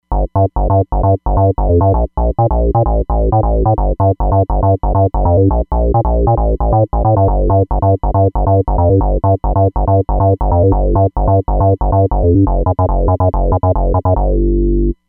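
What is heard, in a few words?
SH-101 Bass; Roland Fantom XR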